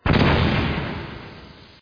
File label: explosion; mgs